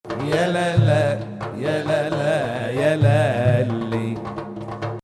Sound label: Hijaz; Zanjaran